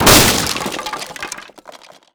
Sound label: wood break big